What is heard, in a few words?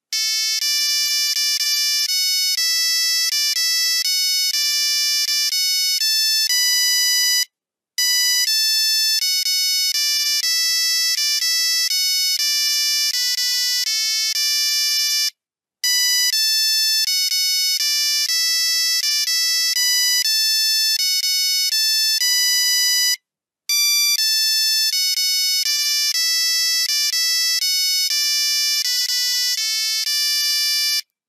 auld lang syne, Nokia 3310; zvuk nokia 3310